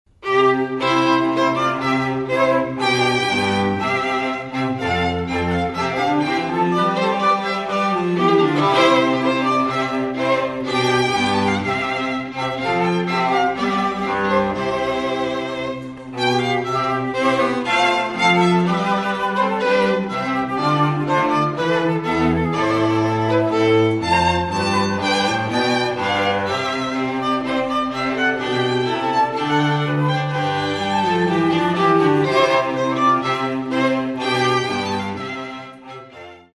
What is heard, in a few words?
te deum charpentier fl cuerdas; Te Deum – Charpentier1